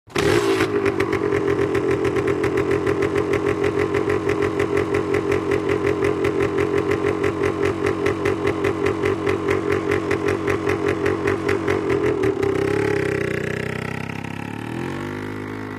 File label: Motorcycle starts, waits, drives away